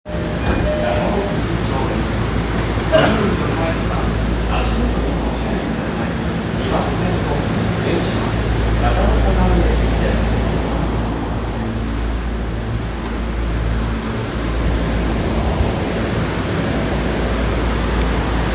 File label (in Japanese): 丸ノ内線中野富士見町東, train sounds